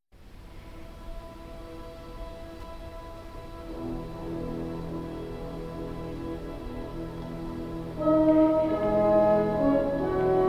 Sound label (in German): Praeludium fuer Orchester